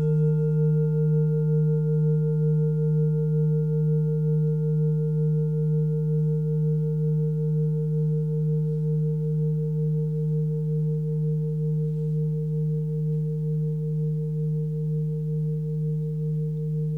klangschale ladakh

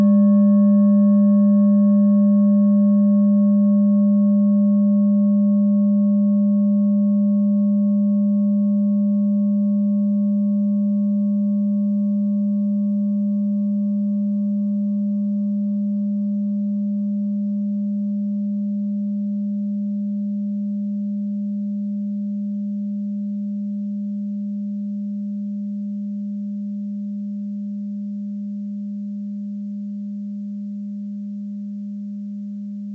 klangschale ladakh; Klangschale Nr.13 Bengalen; Hörprobe der Klangschale

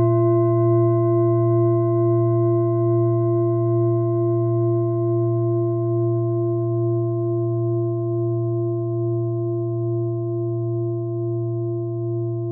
klangschale set; Durchmesser = 24,9cm; Höhe = 10,8cm; Klangschalen-Chakraset

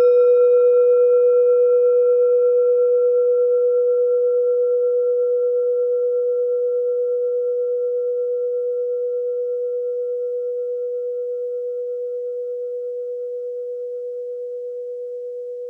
kleine klangschale; Diese Klangschale ist eine Handarbeit

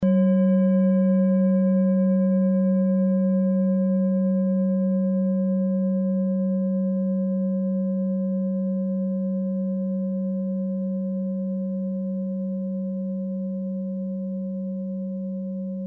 klangschale orissa

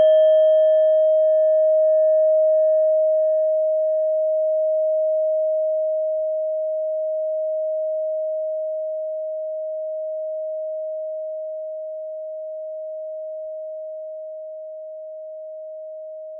klangschale nepal, Klangschalen Nepal

